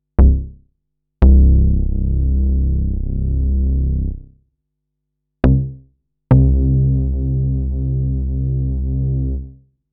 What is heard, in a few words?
BASS Moog Model 15 iOS